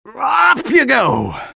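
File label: engineer taunt flip exert; vo; taunts; tf2; engy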